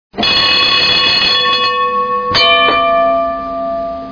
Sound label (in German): strab